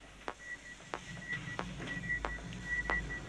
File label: LockChime Motion Tracker; Aliens